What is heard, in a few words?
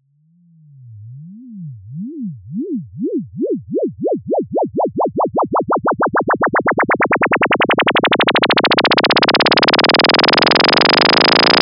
Riser Rubber Band; Riddim; One-Shots; SFX; Ghosthack; Riddim Essentials Volume 2